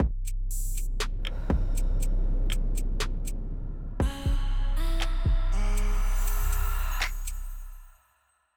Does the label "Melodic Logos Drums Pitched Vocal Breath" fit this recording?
Yes